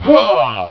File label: huoar; Amiga Soundtracker Sample Packs